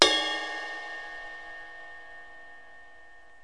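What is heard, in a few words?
small gong; Wired for Sound Pro CD; Aristosoft, Inc.; music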